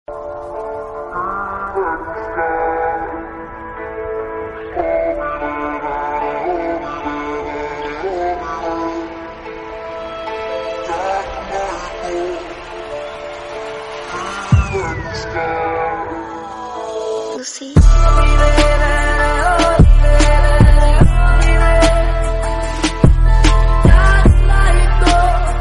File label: heart touching background music tone ringtone